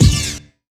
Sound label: Yamaha CS1x Drums